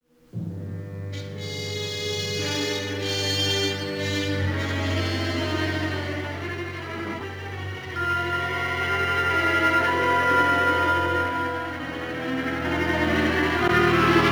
Suspense, music; Suspense 3; Star Trek TOS

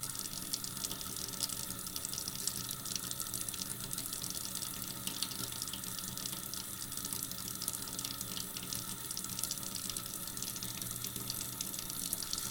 Tap Basin, water

